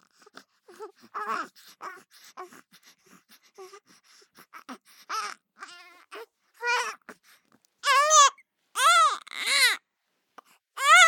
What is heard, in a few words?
BABIES SCREAM Boy 3 months Unconstanst Throat; Baby Boom